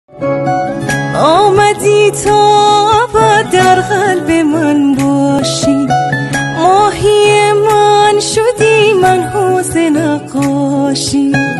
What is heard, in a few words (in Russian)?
иранская музыка